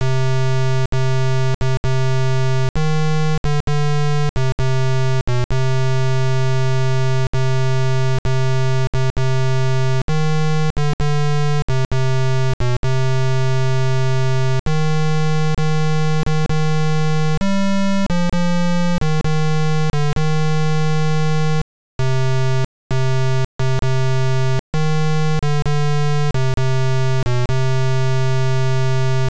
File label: Skateboarding (Failure), pc speaker; California Games II; IBM PC AT